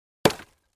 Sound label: Clay Chimney Wall Hit, concrete & brick